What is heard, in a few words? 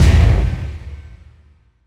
Impact Boom Crash; bottlerocket